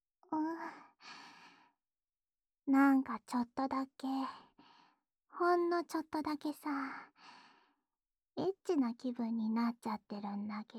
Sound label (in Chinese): 普通的接吻循环; 卿卿我我用